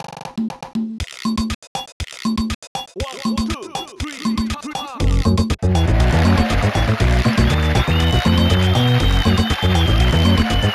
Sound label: Samba; Samba de Combo (Game Ver.)